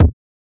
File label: Kick, techno drum